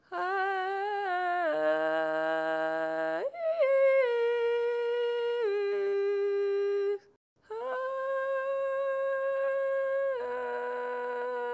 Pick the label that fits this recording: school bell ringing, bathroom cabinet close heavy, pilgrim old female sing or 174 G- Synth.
pilgrim old female sing